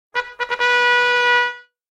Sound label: fanfare; fgo